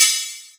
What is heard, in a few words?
Crash Cymbal